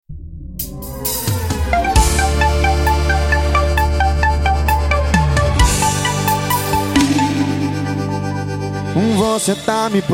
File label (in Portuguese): piseiro arrocha